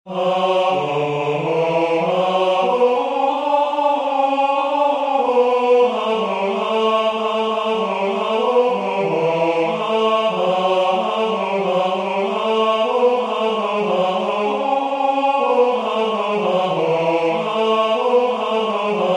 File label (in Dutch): 4 stemmen Tenor